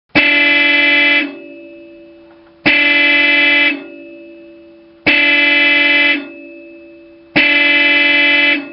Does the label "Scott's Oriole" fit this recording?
No